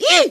pain; toaster; mario

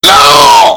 death; wolf

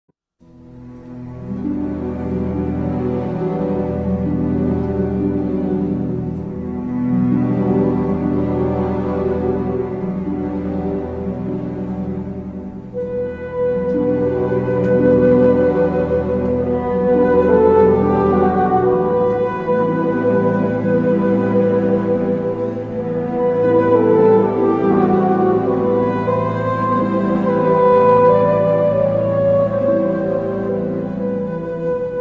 bgmusic; freak fortress 2; dracula

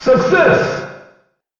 success; tf; noisemakers

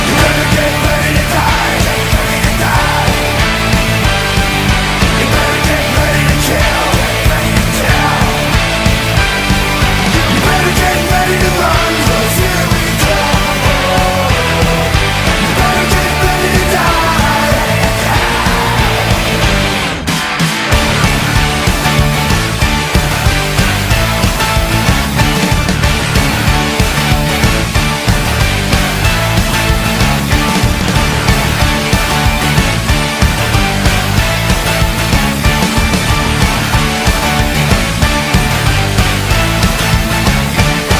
chase; slender; isabelle

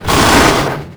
hit; kfsmr flesh clown

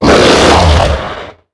baron pain; doom4

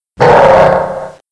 bowser hit; freak fortress 2

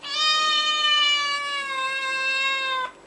cat

